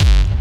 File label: hardbassdrumz, breakcore; Bazzdrumz